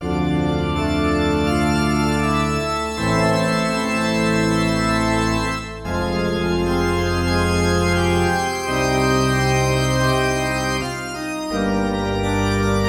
Dark Keys; World of Warcraft 8 Battle for Azeroth gamerip; Blizzard

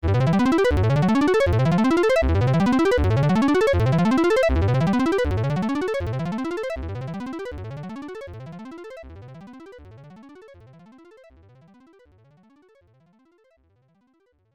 Time Warp (No echo); Sky Soldiers; arcade

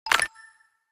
Fireworks Restart

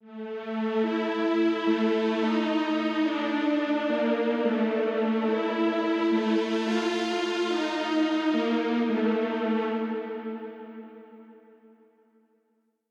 Super Lead; Far Cry

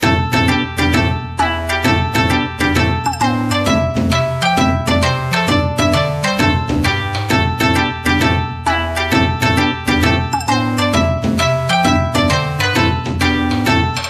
Jingle Rpg Map

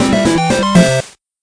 Victory Small; Golden Force; PS4 PS5 Switch Windows Xbox One

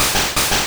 Double Slap; sfx; attack moves; pokemon; gen 1; rby

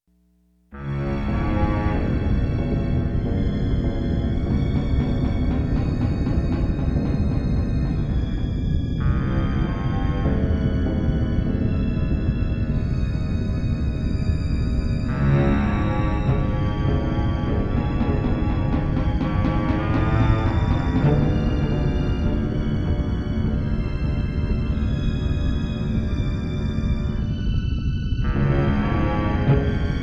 Campfire Menu; I Have No Mouth And I Must Scream

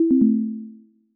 Wrong

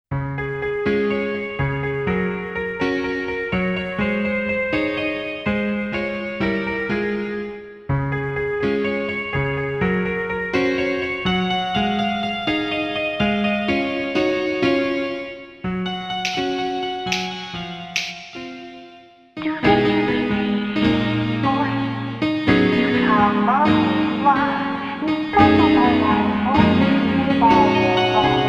Drugstore Song ~ JAZZ Version

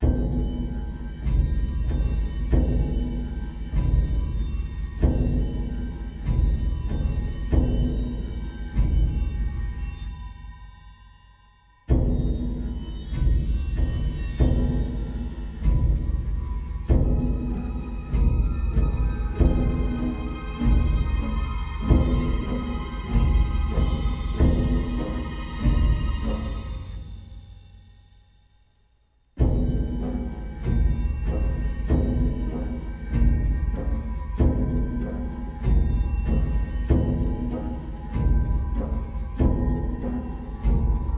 Suspense; Hitman Blood Money; Secret Invasion

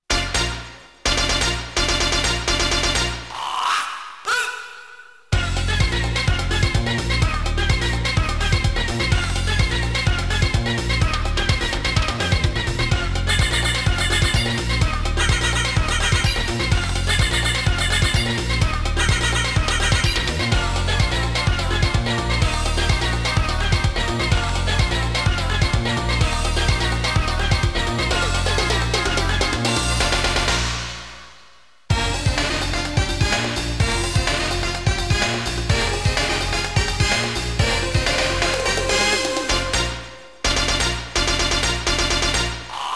Cooking Mambo, Stage 3 Boss BGM; Gokujou Parodius Kako no Eikou wo Motomete

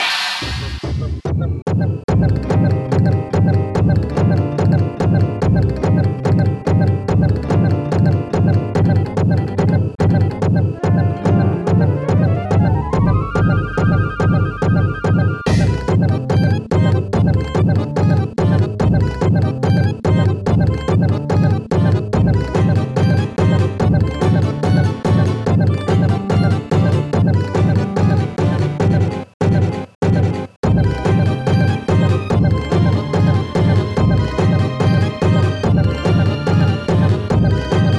Heartbeat; And Yet, El Mariachi

